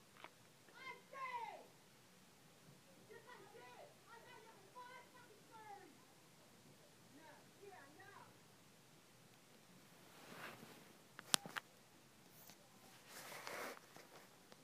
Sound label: Screaming Female